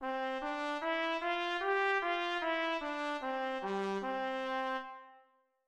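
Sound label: set key C major trumpet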